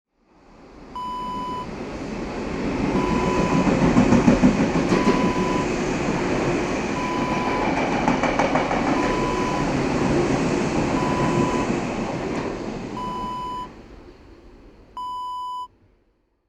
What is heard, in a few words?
metro pass by